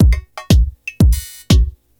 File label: Tech Beat 120, techno 120bpm; musicradar; Beats